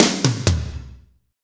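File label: slow shuffle, Roland; Northstar; Drumscapes Roland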